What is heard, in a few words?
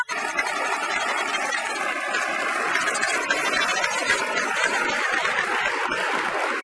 Vocals Castanets Applause Rem